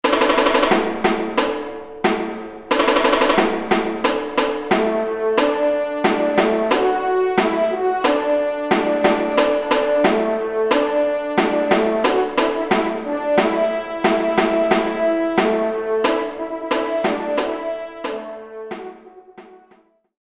Timbales; Arrivee du Roy

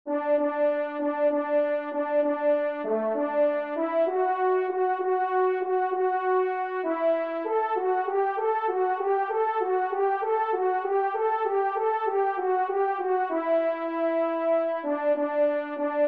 Fanfare Do Maj TRP; 3e Fanfare; Corrette

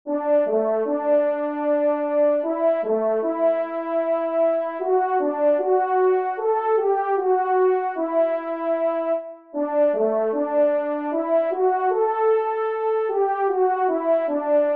romance, trompe; Pupitre 1° Cor; Loisirs du chasseur 11

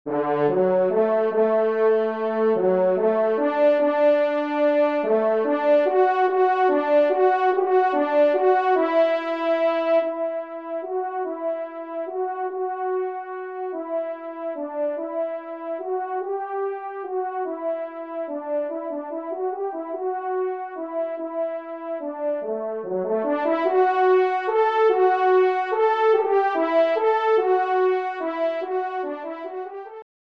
Cloches; 4e Trompe; Carriere; Auteur : F. Carrière

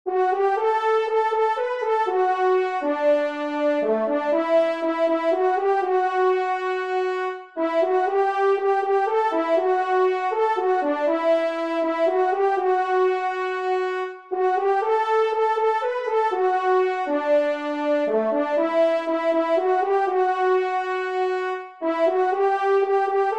TRP; A Pierrelaye; 1e Trompe

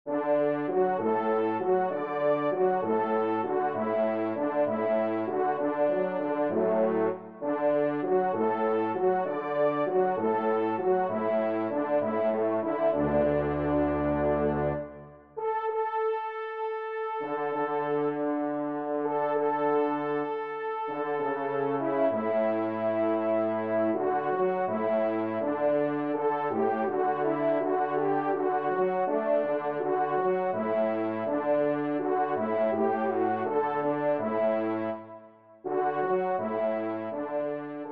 Variation trois Trompes

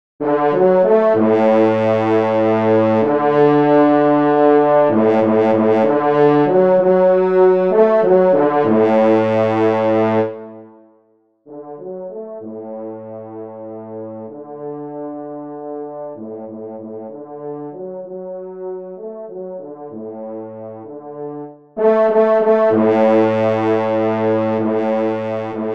trompe; Loisirs du Chasseur; Hymne Foret; Pupitre 3° Cor; Henri Sénée

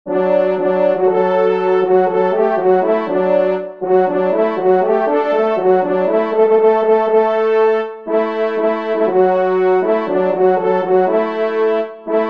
Retraite, trompe; Retraite des Chasseurs

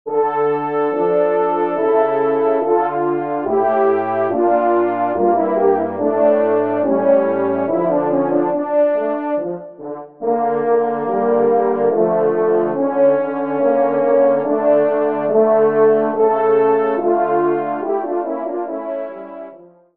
Trio Cors

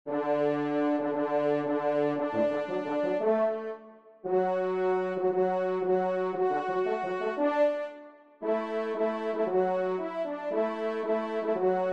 Quatuor; Dauverne; Quatuor N1; 3e Trompe

